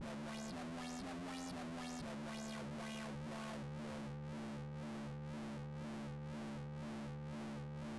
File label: full dist